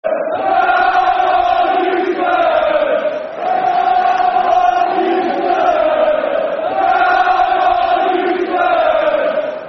Come On You Spurs, Football Chants (Soccer Song); Tottenham Hotspur Thfc

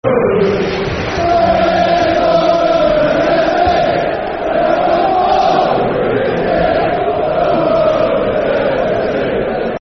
Football Chant; Bristol Rovers; fanchants-com

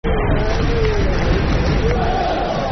Wigan (Clap) [Football Chants (Soccer Songs); Wigan Wafc

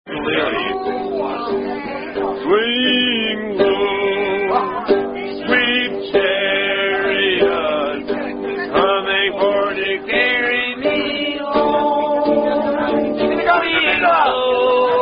Swing Low, Song Leader

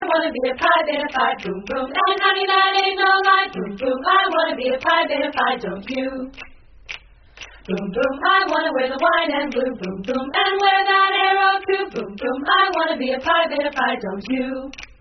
Acapella; Boom Boom; acapella angels the music of pi beta phi

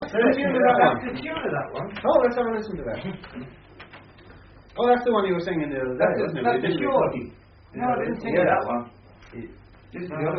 It Was In An Austrian Lager, Singers, Male; 1971

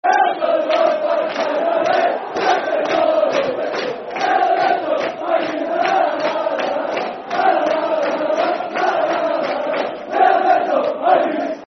Football Chant (Soccer Song), Hibernian; Bertie Mee Said To Bill Shankly; Hibernian Hfc

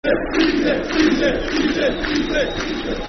Easy Football Chants (Soccer Songs), Cardiff City; fanchants-com

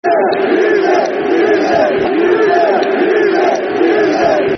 Football Chant (Soccer Songs); Hughesy, Hughesy; Oldham Athletic Oafc

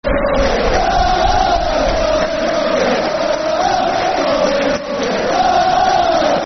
Football Chant; Charles N'zogbia; Newcastle United; Nufc

